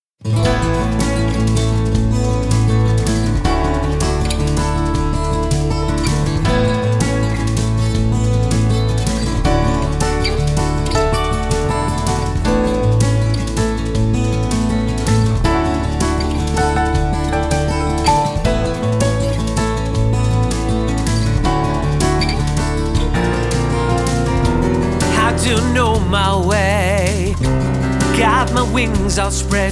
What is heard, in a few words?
acoustic version